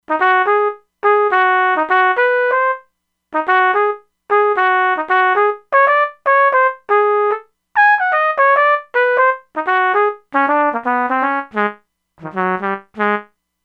Trumpet-Bb, E-mu Proteus-2; Factory Presets

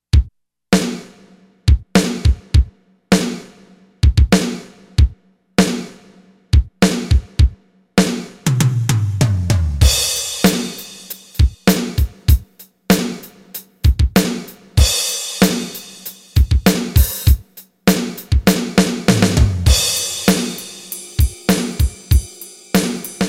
STANDARD, Factory Rhythm Sets, Roland SC-880

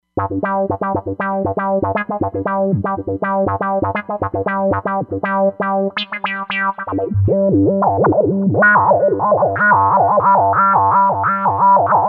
Classic TB, Roland Fantom XR

